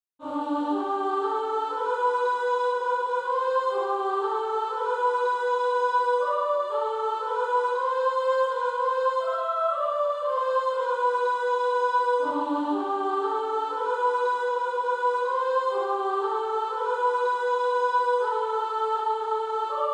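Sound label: Female Choir, Practise Music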